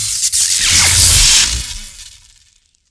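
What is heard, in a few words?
destroy transformer large explosion; Explosions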